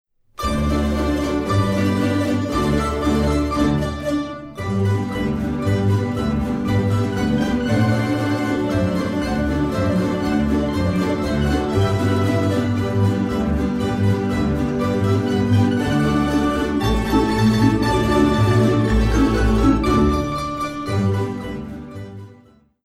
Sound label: Instrumental, Tamburaski Orkestar